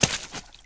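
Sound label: player water splash; firearms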